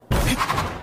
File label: tailwind; valorant; jett